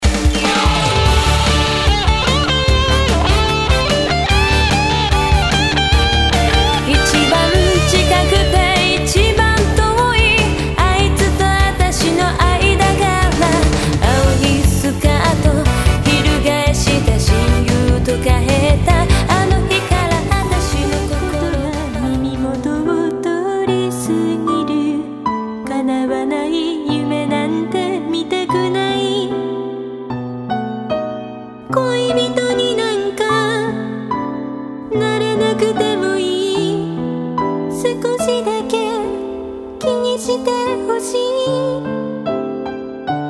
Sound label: menagea xfade